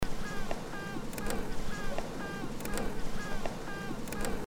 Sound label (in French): Reine Ruche mourante hangar